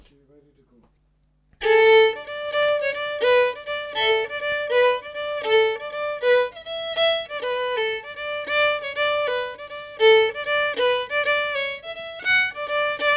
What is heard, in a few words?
jig o slurs